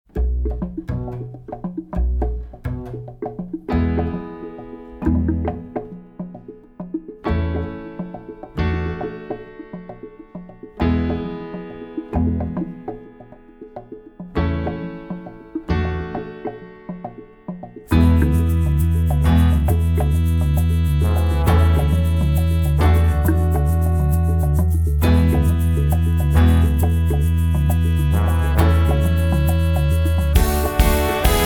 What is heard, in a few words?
without Vocals